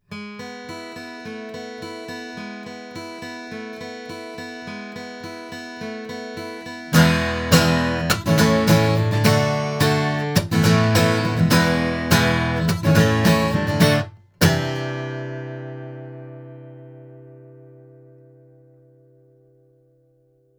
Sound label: front guitar; CT NakedEye; crowley tripp